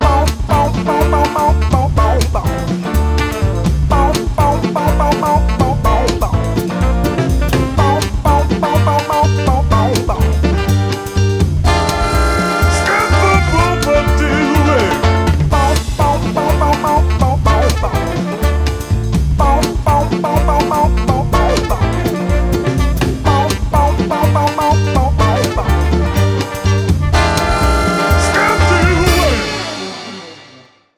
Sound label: Scat Singing